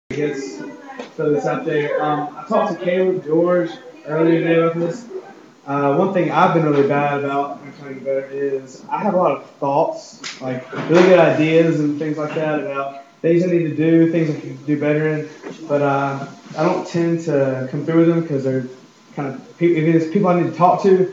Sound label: College Devo Singing